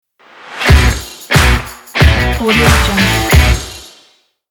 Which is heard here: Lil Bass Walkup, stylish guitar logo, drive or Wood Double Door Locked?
stylish guitar logo